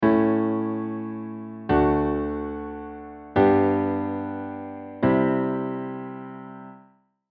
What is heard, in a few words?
Chromatic Alterations